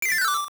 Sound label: Funny Joke; Zelda Oracle